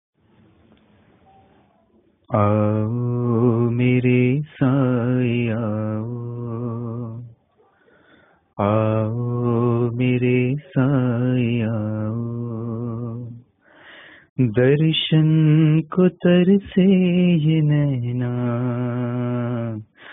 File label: DEVOTIONAL SONG; RADIOSAI; FESTIVAL; AAO MERE SAI AAO